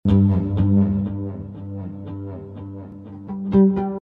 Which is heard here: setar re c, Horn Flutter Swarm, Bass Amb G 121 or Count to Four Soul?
Bass Amb G 121